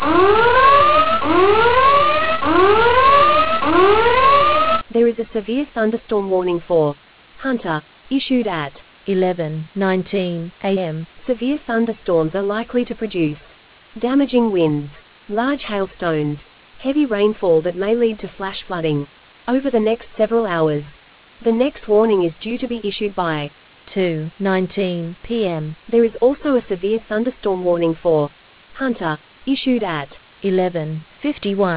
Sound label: storm audio